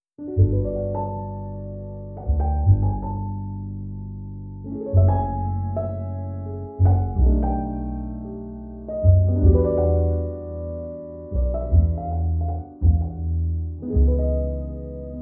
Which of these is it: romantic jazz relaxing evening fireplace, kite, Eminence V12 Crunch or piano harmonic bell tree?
romantic jazz relaxing evening fireplace